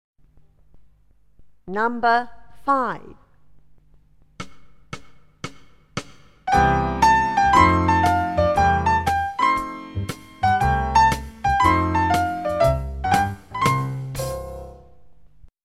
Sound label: primary tap taps steps